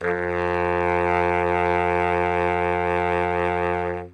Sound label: notes, sax